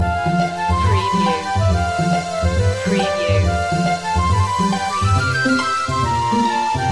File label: the squirrel loop